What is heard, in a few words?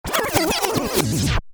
Music rewind, Rewind: Tape Effect, Fast Rewind Of Music; Tape Players (Sound Effects:Electronics)